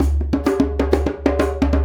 Djembe and Hi Hat Fill

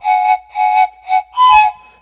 Thai Pan Pipes

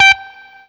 BLU POP, Guitar & Bass

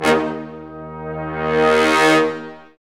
BRS, Lrg Ensemble; Roland L-CD702

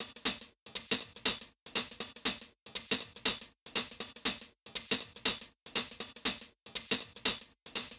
Hh Sh Loop 120; Hh-Shake Loops 120; Equipped Music; Loungin House

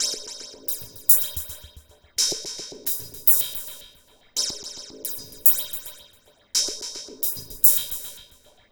Percussive

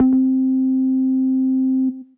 Synth C, Synth Prophet One Shots; Electronisounds

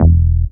DR. LOOP, BASS; X-Static Goldmine 3

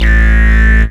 dance, bass

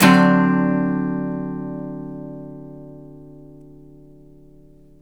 CHORDS, Blues Guitar; ILIO; Fretworks

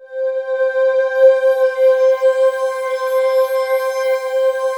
WS NL C 5, Korg Wavestation; Back In Time Records; Korg Universe VOL-1

